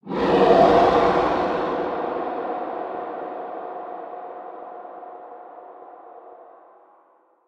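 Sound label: ambience unknown creatures big snake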